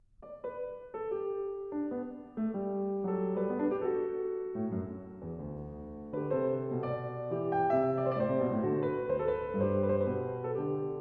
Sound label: Loure, KLAVIER; French Suite No 5 In G Major; BACH